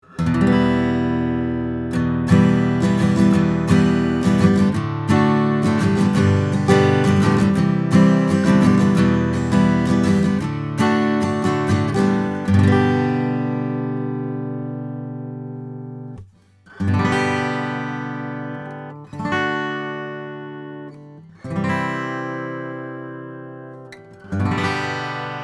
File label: RME Schoeps CMC1 MK4 Acoustic Git